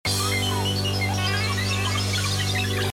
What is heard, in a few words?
synth recipe pico